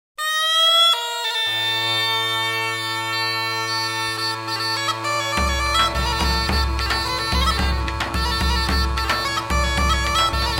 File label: Greece Troiro, Folk Dances; Petaluma; 1st String Folk Dances; Greek